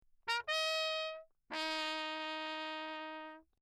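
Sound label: trumpet mute signal; Perinétventiltrompete; Lechner; Engemann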